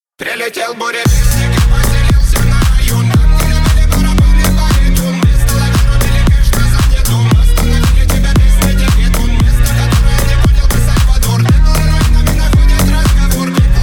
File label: remix rington; russian